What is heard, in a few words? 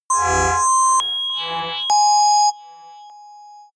synthesized bells high; digital